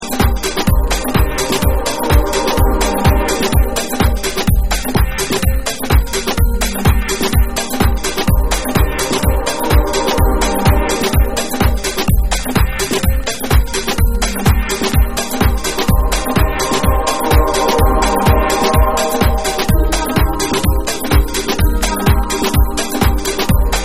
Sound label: AFRICAN DRUM